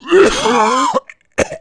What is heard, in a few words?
COUGH; Messiah